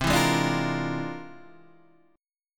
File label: F Minor Major 13th, C